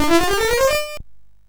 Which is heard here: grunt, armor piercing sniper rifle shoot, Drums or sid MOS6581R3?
sid MOS6581R3